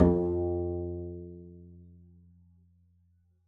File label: pizz f2, double bass; dsmolken